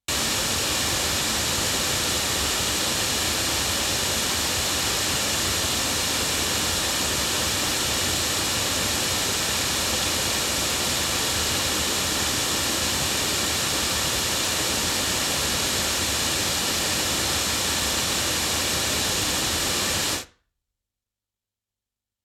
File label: Golden Age D1 loudspeakers